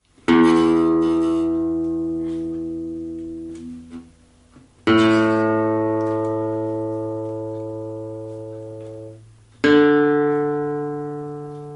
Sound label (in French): accordage